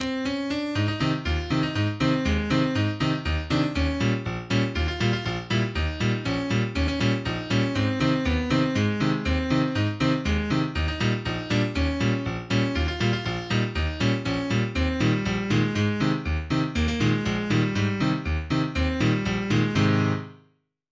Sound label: Squeezebox